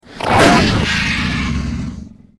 DINOSAUR ROAR SOUND

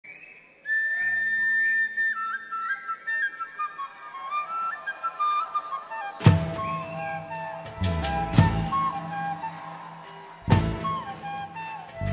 flute ringtone; SADHGURU

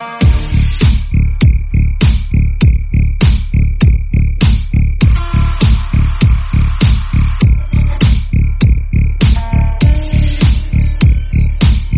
instrumental ringtone

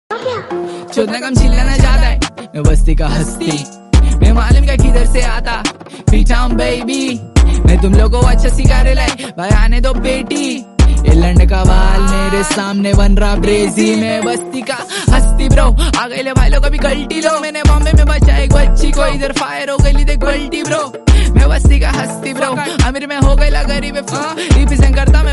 RAP SONG RINGTONE

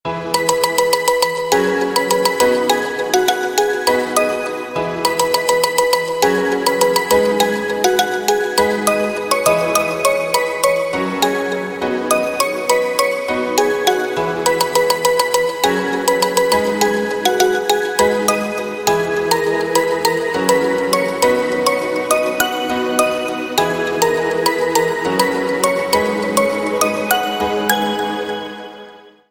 instrumental ringtone

